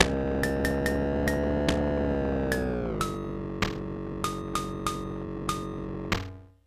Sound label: keys glitch pitch